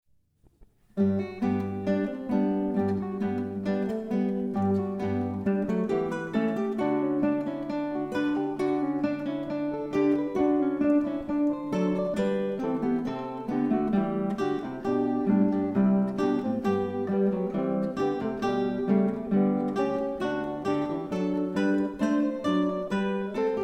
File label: allegro bwv 1001 mono